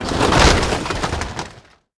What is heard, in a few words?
tent; World of Tanks Blitz